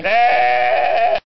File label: maeh